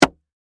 Skateboard Land, Sports, Skateboard, Land, Wood, Surface; Skateboarding Sound Effects; BlastWave